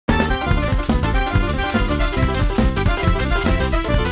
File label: Jazz